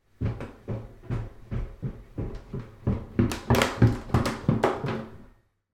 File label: foley footsteps up wooden stairs underneath creaky